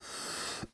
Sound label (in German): Atmen Ein Kraenklich